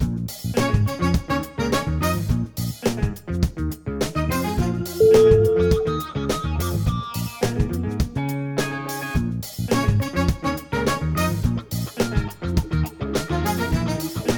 funky

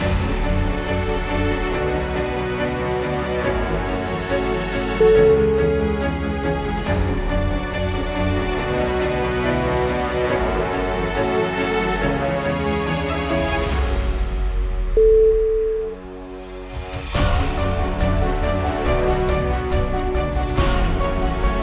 victorious cinematic

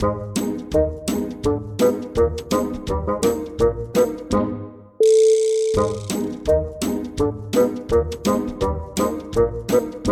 bassoon comedy

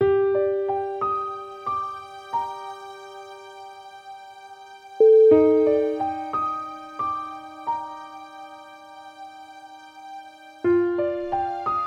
sad sorrow

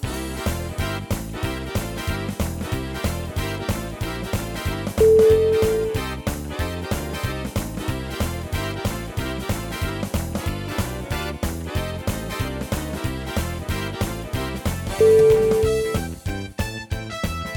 go tell it on the mountain jazz

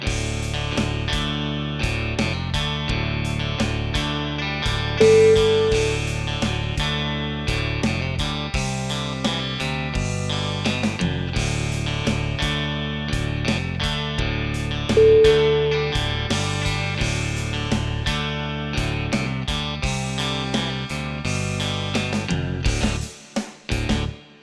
southern shine

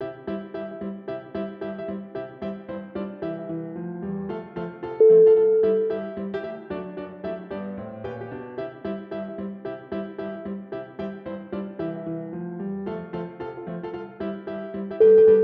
jingle bells piano rag

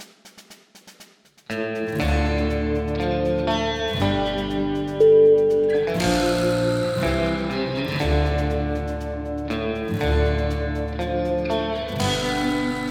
western cowboys music logo

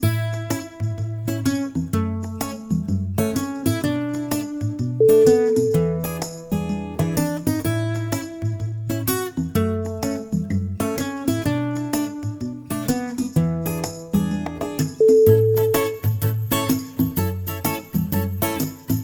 acoustic sun

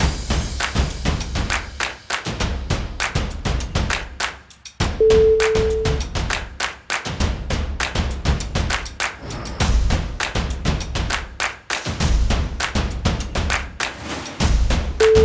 stomps and claps

